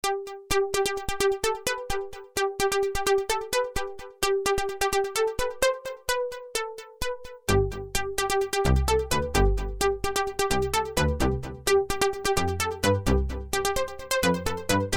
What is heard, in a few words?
yamaha dx 200